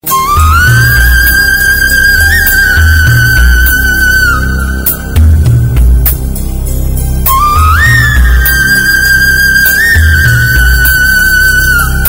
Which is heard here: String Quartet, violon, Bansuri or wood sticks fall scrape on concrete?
Bansuri